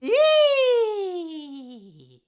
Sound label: eee, Voice; ooh ahh